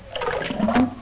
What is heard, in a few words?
clank